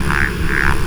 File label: FX, Futurist Drum & Bass